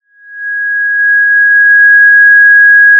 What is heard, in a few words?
Sound of a police car siren